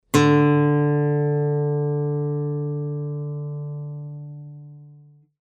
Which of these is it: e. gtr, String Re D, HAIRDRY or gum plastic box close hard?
String Re D